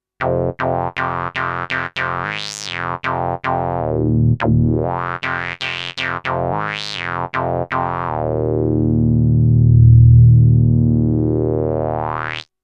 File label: Moog Bandpass; Mayer MD900